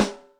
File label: Rim, Acoustic Snare; Yamaha DTXpress; PhlyRiM